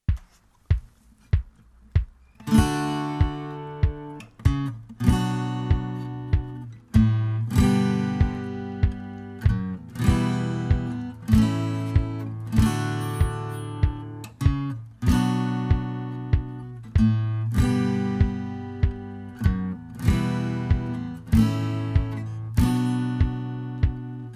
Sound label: maid behind the bar, banjo